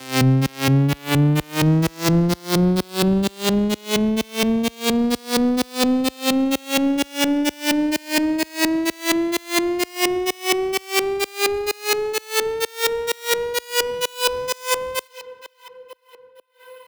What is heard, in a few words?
128BPM Rise Up 8bars; Shockwave - Fx Elements vol 1